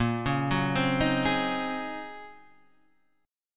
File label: Eb Minor 13th Bb